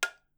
ceramic; Phasmophobia

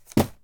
Throwing; Phasmophobia

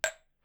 glass; Phasmophobia

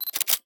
Photo take; Phasmophobia

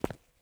FOOTSTEP Trainers Asphalt Run mono; Phasmophobia

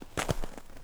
FOOTSTEP Trainers Snow Compact Walk Slow; Phasmophobia

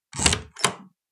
Door handle open; Phasmophobia

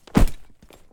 Bag drop; Phasmophobia

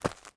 FOOTSTEP Trainers Gravel Compact Run mono; Phasmophobia

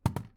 Cam drop; Phasmophobia

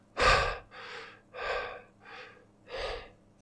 Male Breathing; Phasmophobia

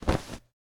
Tent hit; Phasmophobia

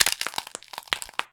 finger crunch; Phasmophobia

